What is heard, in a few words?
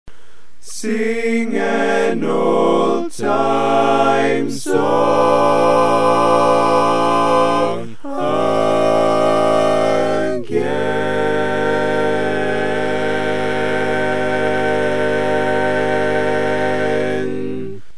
All Parts, Barbershop Tags